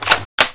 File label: m4 clip insert; weapons